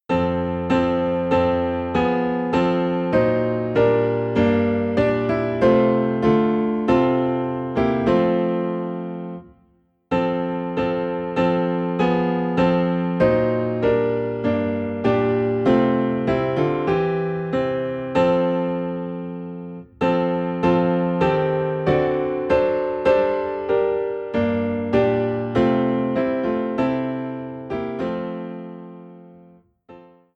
Piano in F

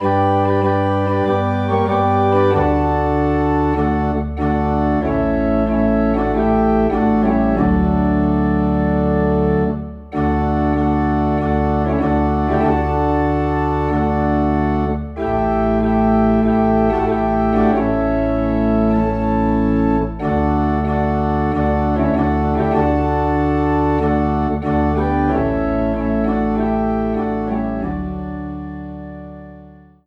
Organ in D

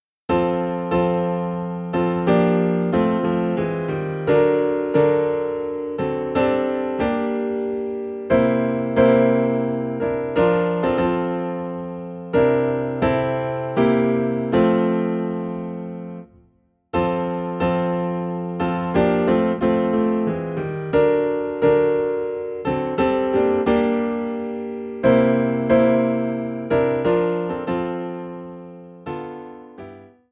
Piano in F

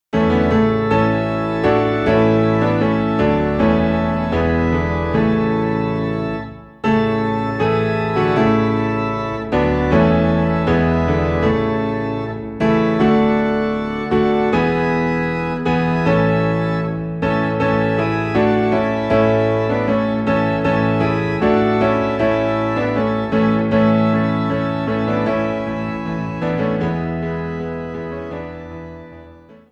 Piano and Organ Low in D; Joy To the World; christmas

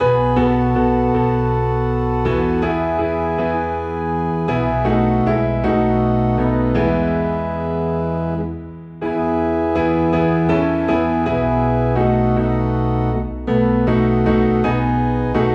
Piano and Organ in E

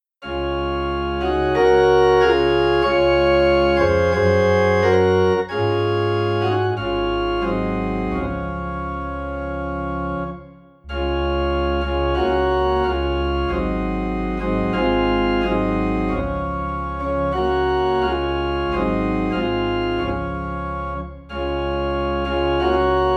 Organ in D Flat; I Surrender All

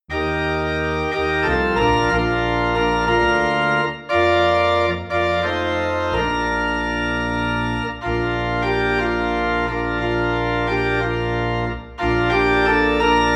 Organ in B Flat

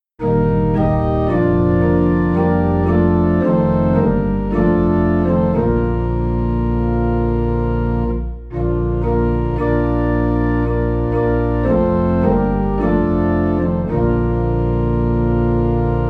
Organ Low in B Flat

